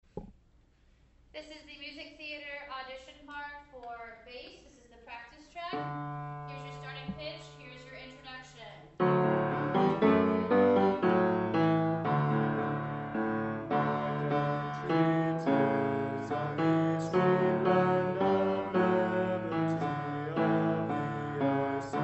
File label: bass